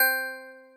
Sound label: Coins; ArmyRaid; Interface and Item Sounds; Items & Collectables